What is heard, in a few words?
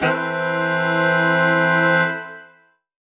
Horn Swell Alt Esus4min6; undercover samples